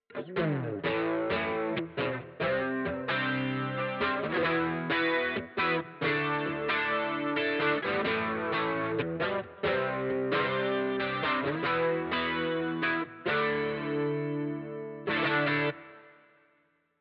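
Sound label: DUAL CUBE Brit Combo w Chorus Rev, guitar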